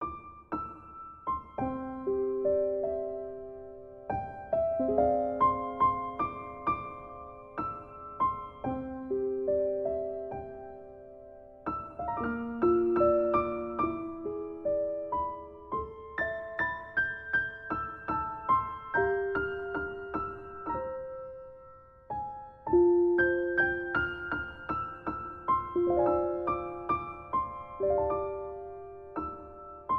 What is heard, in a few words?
Piano